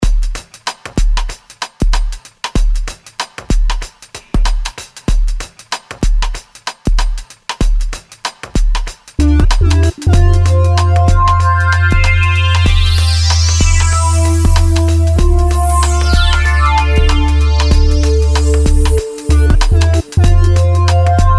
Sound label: pad; discovery2